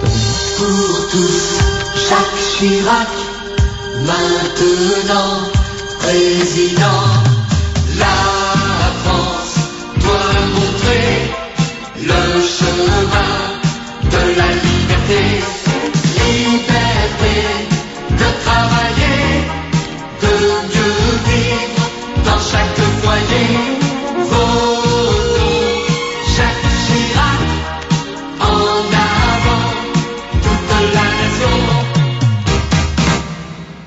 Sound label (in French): Chanson promotion; Chirac